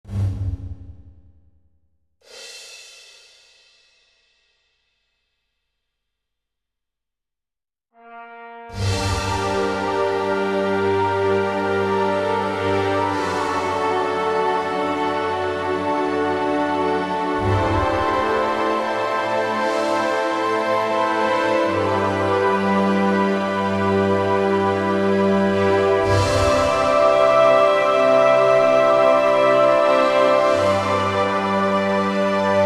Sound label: orchestr